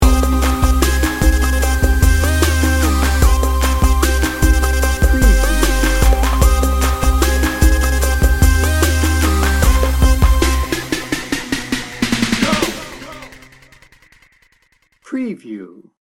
ghetto boyz sting bumper

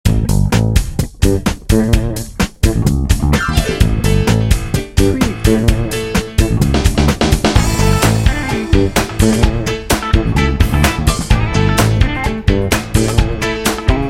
rocker funk bed

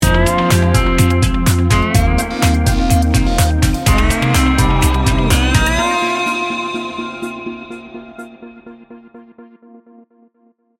atmospheric ambrosia sting bumper

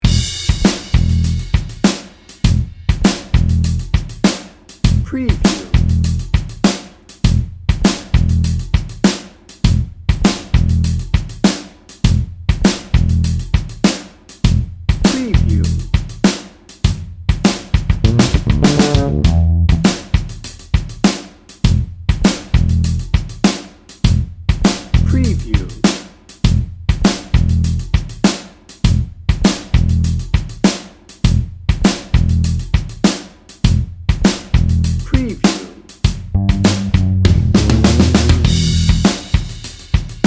funk factory

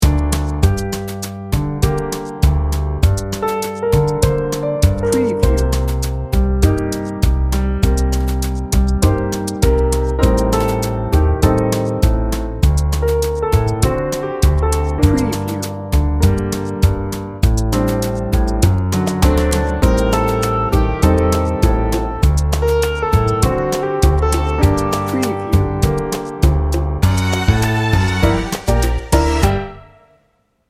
rhumba romance

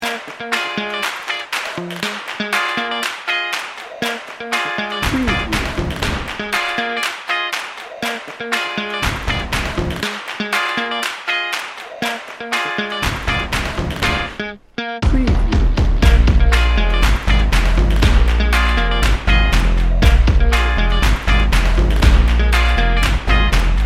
funk junkie

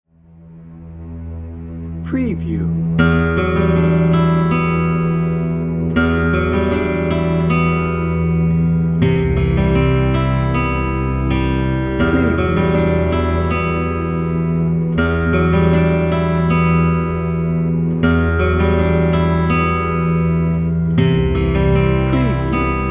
shadows no piano

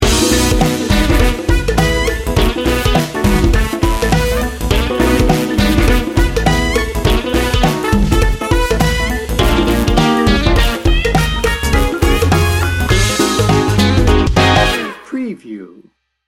funk in da house

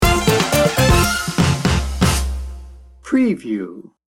tropical salsa party sting bumper

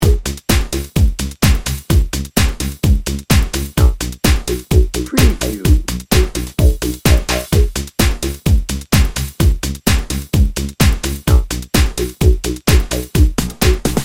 electro dnb

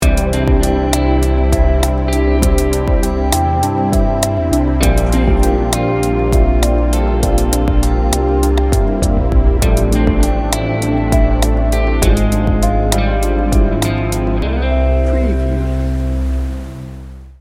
warm and atmospheric

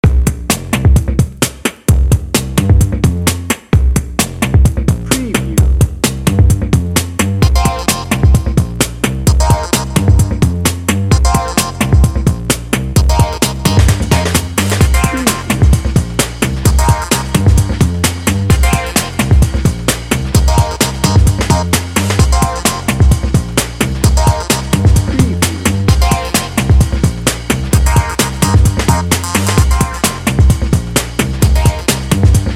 bouncy hip hop bed

